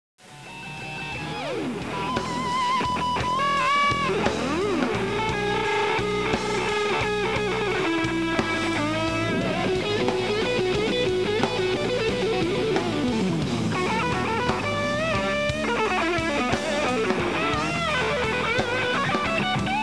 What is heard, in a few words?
jam